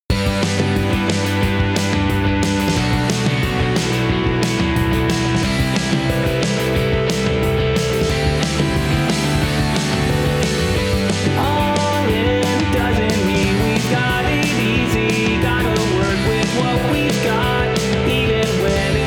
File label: forest strings